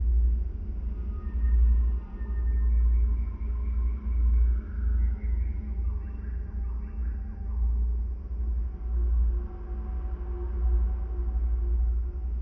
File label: Ambience throne room